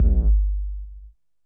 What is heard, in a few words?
3D K808, 3 D AMBIENCE